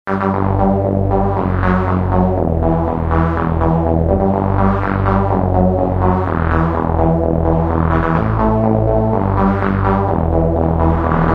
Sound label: Mod-Trance; Roland M-DC1